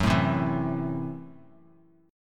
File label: Gb Minor 7th Flat 5th, Bass Standard; strummed; JGuitar